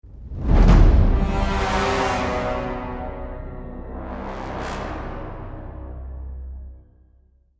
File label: lost round, music